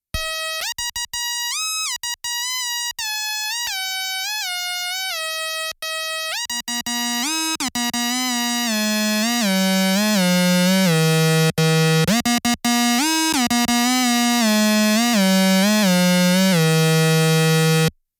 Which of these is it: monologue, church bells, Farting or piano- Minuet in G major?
monologue